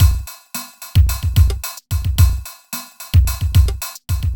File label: r n b kit 110